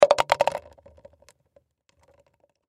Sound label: Plastic Cup Drop; Plastic Cup Drop Roll; Drop Sound Effects; Foley; Sound Effects:Objects; SoundEffects-Blastwave